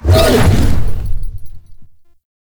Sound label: Horse Glide Wing Flap Flight Boost; Warframe; Duviri Horse; Vehicles